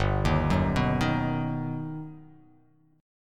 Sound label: G Augmented Major 7th